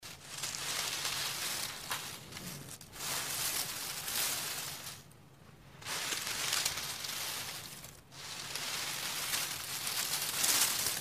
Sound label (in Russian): shelest suhih listiev